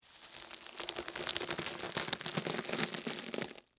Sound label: Crunch Debris Ice, Cream, Cone; Texture; Crunches; Objects; BlastWave